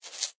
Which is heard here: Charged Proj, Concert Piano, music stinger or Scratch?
Scratch